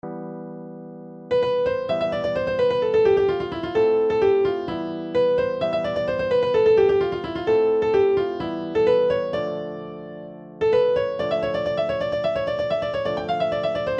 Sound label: keyboard